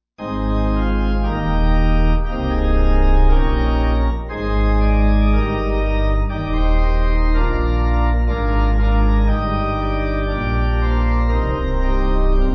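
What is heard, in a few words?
Pipe Organ, Music Backing Files for Churches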